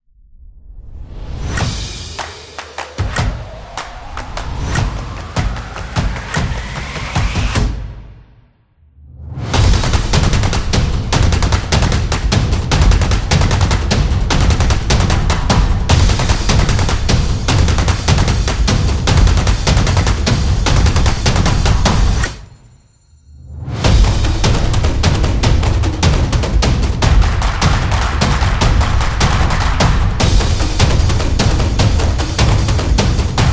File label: The Stomps; fast tempo